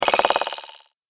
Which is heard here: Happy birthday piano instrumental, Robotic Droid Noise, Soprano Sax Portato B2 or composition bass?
Robotic Droid Noise